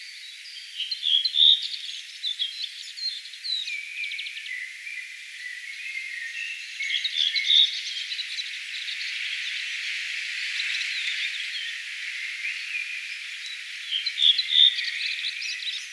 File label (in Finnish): tuolla tavoin laulava punakylkirastaslintu